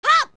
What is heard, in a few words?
Young Link Attack; Link's attack shouts (sword slashes, rolls, or targeted jumps); OOT